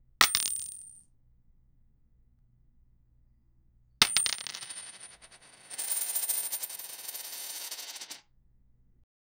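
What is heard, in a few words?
shiny metal coins dropping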